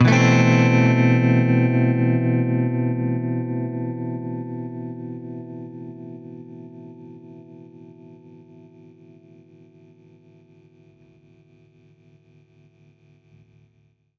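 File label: Baritone Chord A minor add9, guitar chords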